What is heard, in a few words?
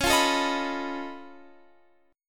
C sharp Augmented 9th C sharp, chord